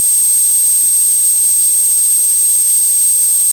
Turbo Whistle

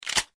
Load

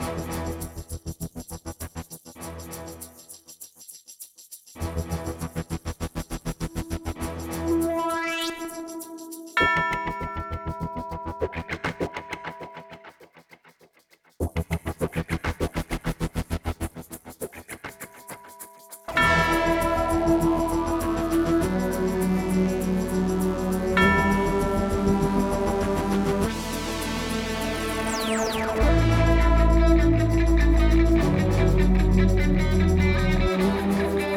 Build Phase Loop

